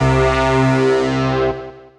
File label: Fourth Degree Lead; Ableton; Factory Packs; Retro Synths; P-50 Linear; P-50 Structures